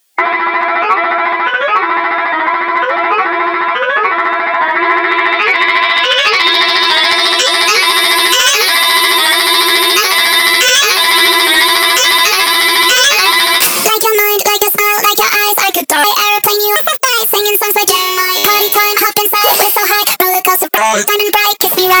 vocals

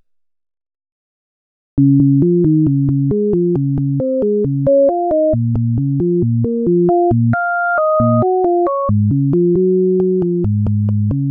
racing sine

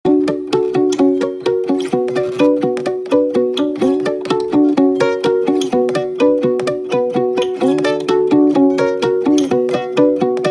127 Bmin instrumental

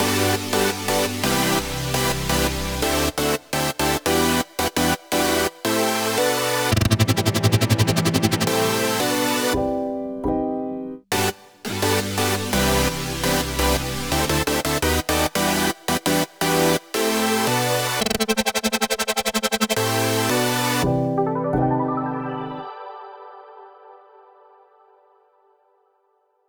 g maj drop

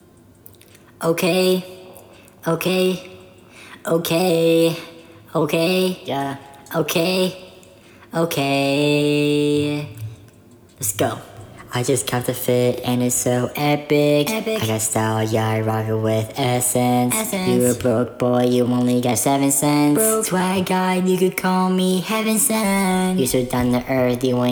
vocals; okay

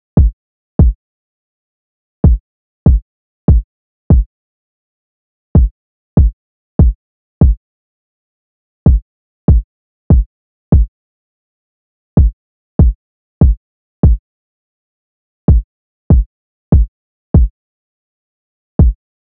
kick

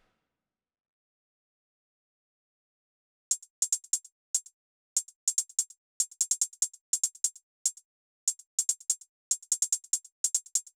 hat

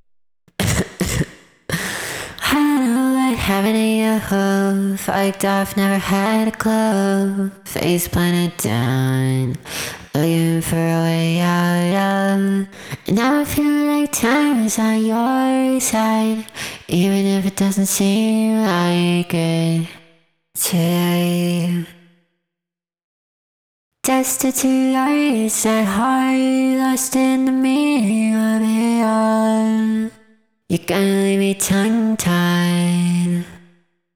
vox

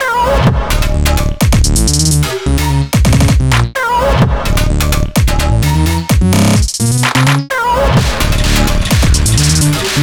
128bpm Instrumental

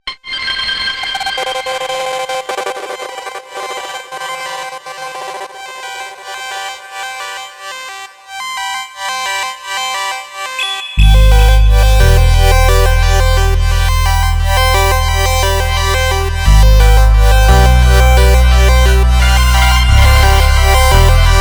instrumental full

